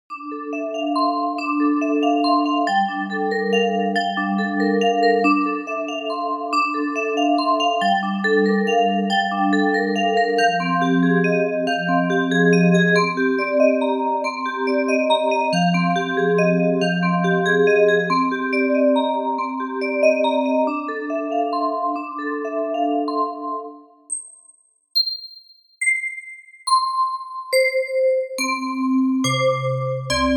Noise Glockenspiel, struck instrument simulator

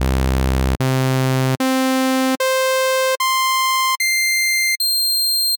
Saw, struck instrument simulator

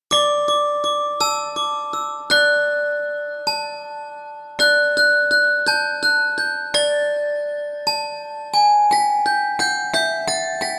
glockenspiel

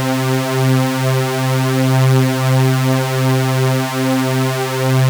supersaw

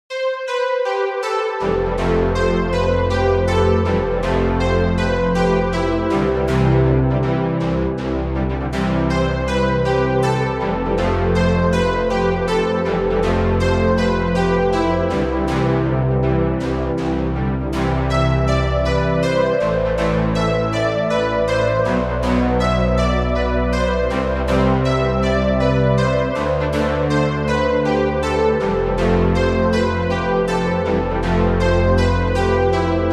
Noise Saw, struck instrument simulator

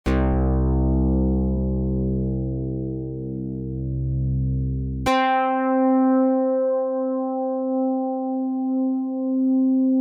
karplus 2

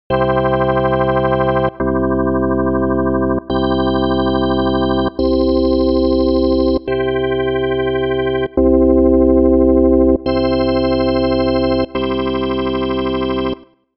hammond

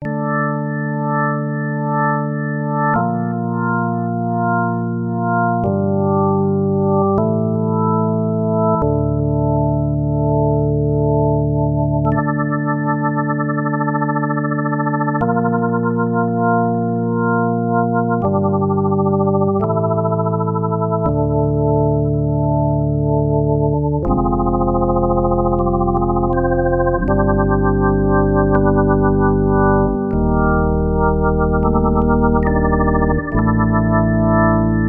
virtual analog synthesizer

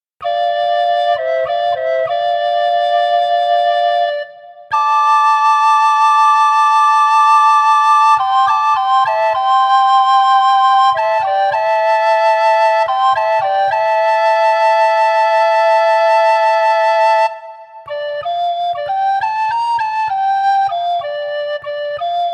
recorder soprano

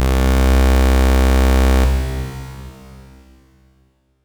tone

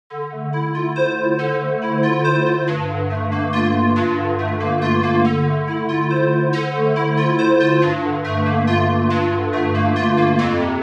Noise Square, struck instrument simulator

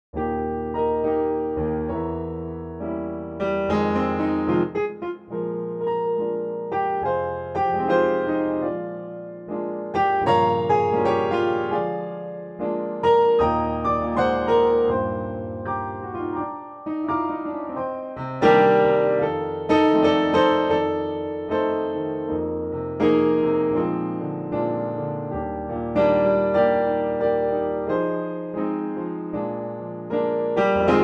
piano

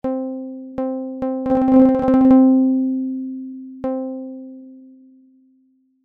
string model

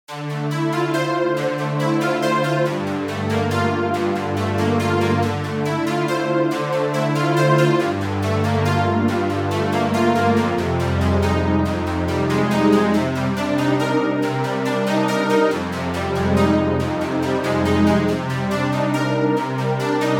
Noise Saw, struck instrument simulator

